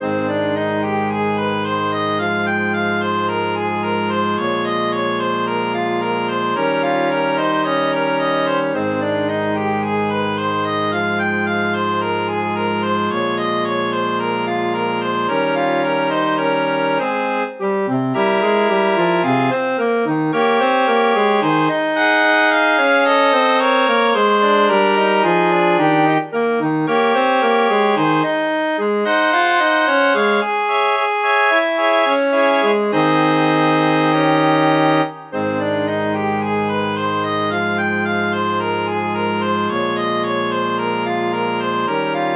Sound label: Invocation for harmonium; synth